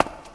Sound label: cave grounds step; Golden Crayon Sounds